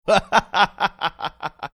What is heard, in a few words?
laughing